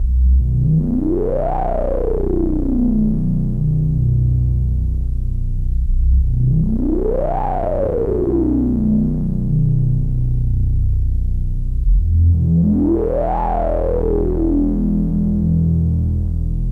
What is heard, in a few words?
Sweep, Synth